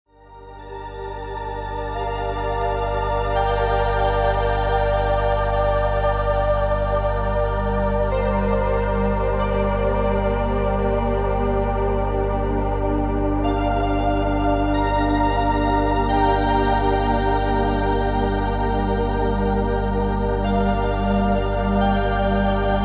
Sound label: Music for Reiki and Meditation